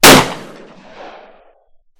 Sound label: Shotgun 12 Gage Winchester Pump Shotgun Single Shot